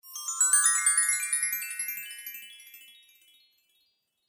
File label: Pantomime Fairy Spell, Cartoon Sound Design Sound Effects